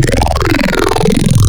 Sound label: Jabber Mouth, Wavetables; Cymatics; Wavetables Collection Vol 3; Serum